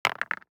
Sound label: DICE on Neoprene, Throw and Roll, Mini, 2 Two Dice; Samples; ArticulatedSounds; DICE Sfx Party (Extended)